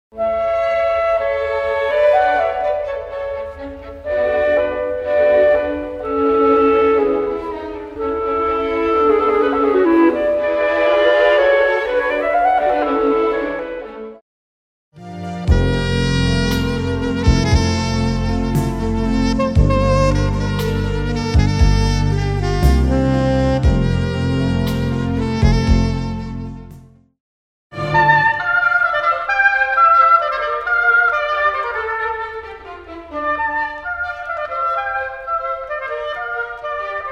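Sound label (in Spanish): clarinete, saxofon, oboe, fagot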